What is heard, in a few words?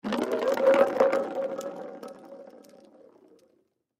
Can Roll Left To Right; foley; Rolling Sound Effects; Objects; Blastwave